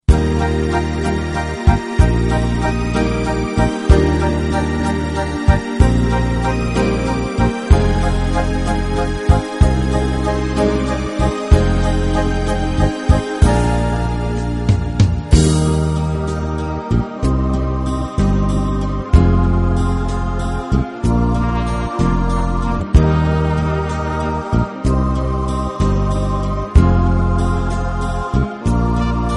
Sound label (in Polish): harfa instr